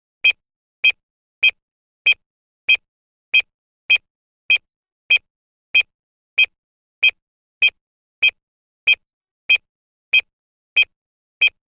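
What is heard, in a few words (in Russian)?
hi-tech отсчет; Звуки обратного отсчета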